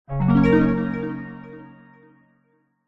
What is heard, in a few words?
multimedia alert bright warm system positive